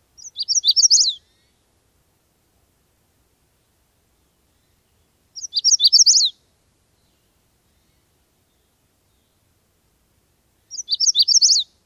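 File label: Magnolia Warbler, songs number 3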